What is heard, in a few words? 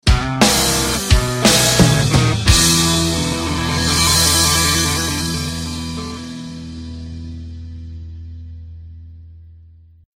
Fail; the muscle hustle; android ios